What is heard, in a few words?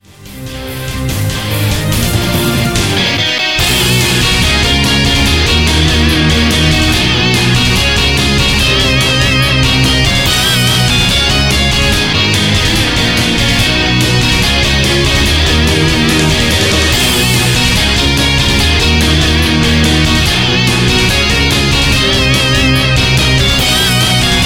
romantic fall